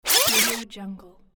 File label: rewind acceleration